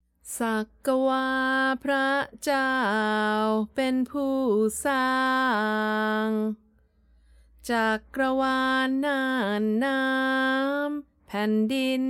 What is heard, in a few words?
Yam Vocal Poetry